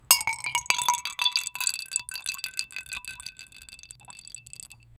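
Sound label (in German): leises flüsterndes zauber, glugging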